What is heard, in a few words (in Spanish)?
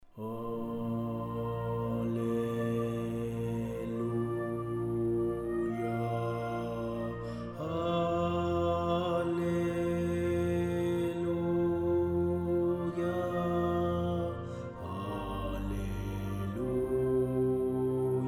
BAJOS ALELUYA; Misa Brevis Jesus